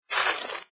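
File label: Crumple Paper Into Ball reverse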